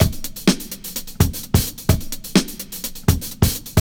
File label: REAL DRUM LOOP 127; Ueberschall; Jam Box